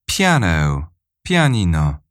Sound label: piano